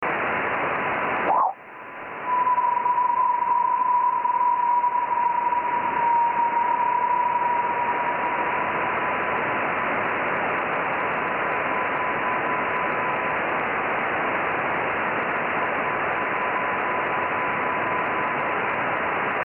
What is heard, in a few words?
ping